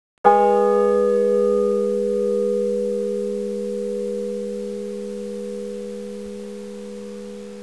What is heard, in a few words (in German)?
zellerfeld stahl klein a mono